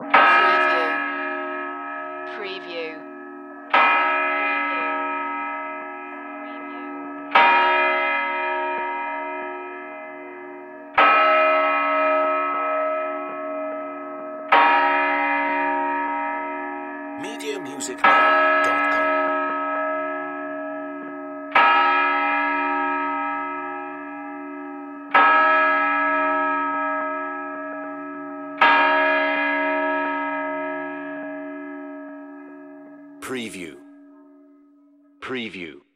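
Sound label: Antique Railroad Crossing Bell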